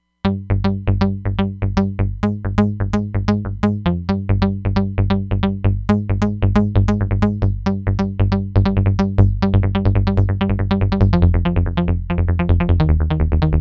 Solid Goa, Roland Fantom XR

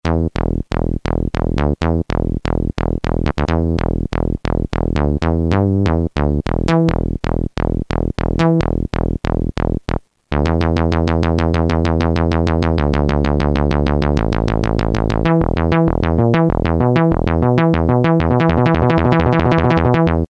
TB-303 Bs; Roland M-DC1

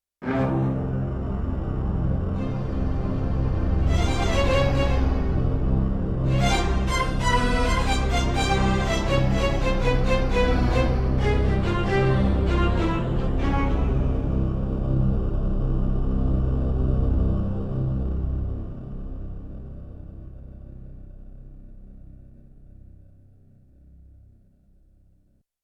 Strings; Yamaha TX16W Sound Library; The Fifth Wonder

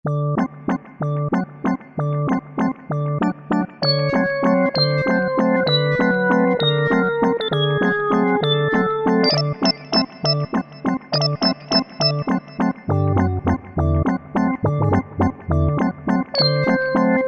Ick, Yamaha TG500

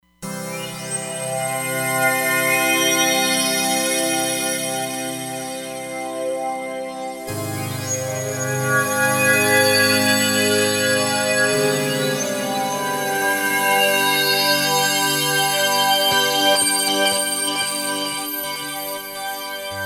Pad, Roland Fantom XR

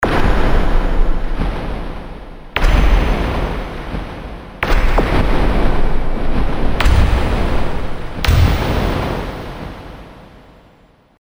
BigSound, Generalmusic Equinox

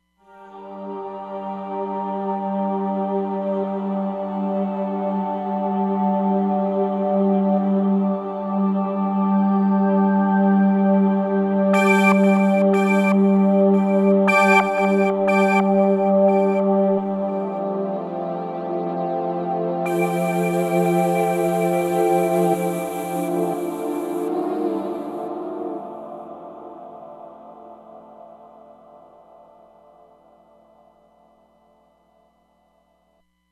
OB Aaahs, Roland Fantom XR

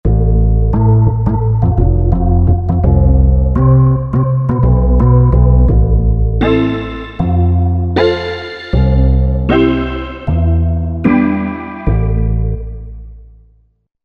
Bass synth; Korg MSC-8S Percussion; Bassynth!!

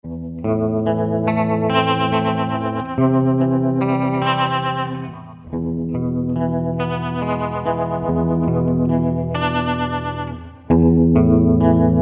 ROTARY CLUB, Digitech RP200

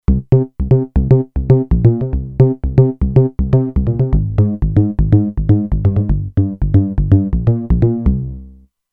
Tite Rave Bass, Kurzweil K2500X; Factory Programs

